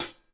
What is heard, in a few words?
new closed hat; DRUMS (SINGLE HITS); Hats